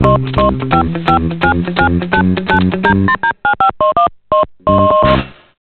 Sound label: Jingle; Sonofon